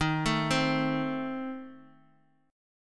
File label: A Diminished Eb